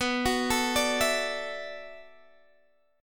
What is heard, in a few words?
B Minor 11th B down Guitar Open D